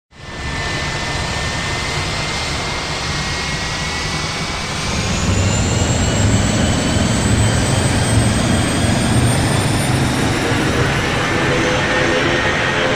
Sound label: Airbus A320 Take Off